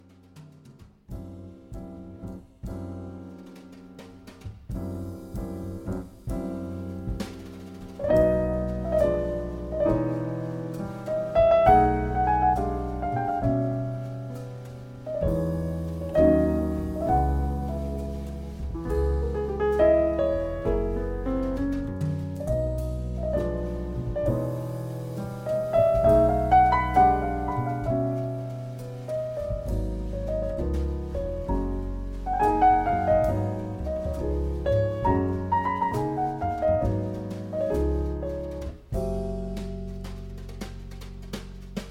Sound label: Nocturne B Major